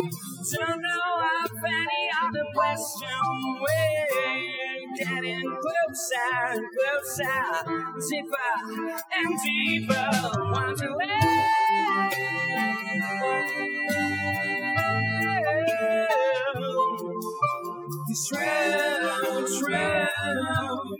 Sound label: vocals; SiSEC11